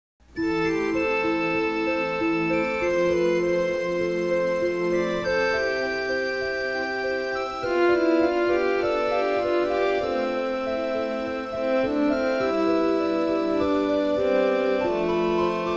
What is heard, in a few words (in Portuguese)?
esperança instrumental